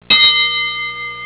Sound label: bell